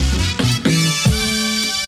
HORNS; Zero G; Man Machine

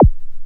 DONT ZAP, EQ KICKS; Masterbits - TR-Minator

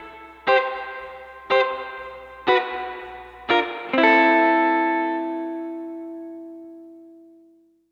Guitar, 70s Analog Funk; Sonic Foundry (Sony Creative Software); What it Is!; Classic Caddy

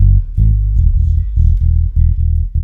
BASS LOOP, Ragga & Dub Reggae

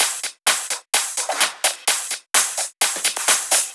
Drum Loop 128BPM Percussion; VEC5 Drumloops; VEC5 Multi Loops; Loop Elements

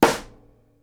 SNARE, Live Snares

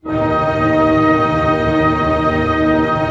TREM D# PP, orch trem; Sound On Sound - Peter Siedleczek's Orchestra

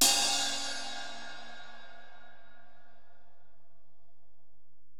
Ride, Alesis DM-PRO, Drum Machines

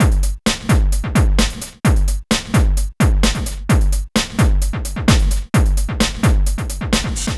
NRG Break, Trance, Drums, Breaks

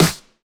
Snares; Vengeance - Essential Clubsounds Vol.4

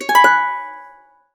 HC C# MIN 11, Harps; USB Soundscan vol.62; AKAI

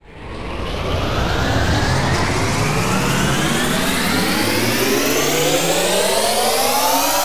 sfx sci fi; Masterbits - Switch FX

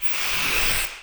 AIR SPRAY; InVision Interactive; The Piano

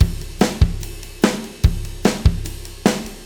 Stranger 147; Zero-G; Monster Beats

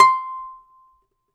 domra, Russian strings; World Combos; E-MU Producer Series Vol. 7 – Old World Instruments

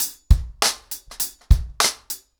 100BPM, ACOUSTIC DRUMS; The Roots Drumloops

